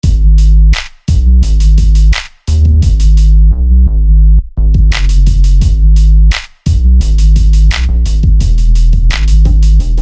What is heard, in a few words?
Deep Bass; En2Deep Bass